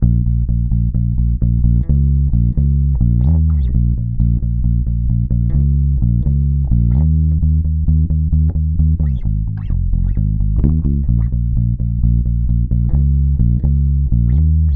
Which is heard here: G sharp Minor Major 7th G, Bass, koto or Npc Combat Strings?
Bass